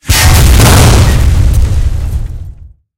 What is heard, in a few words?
tank hit aphe mid; tanks; War Thunder